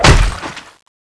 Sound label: shoot egg, zombie; meatwall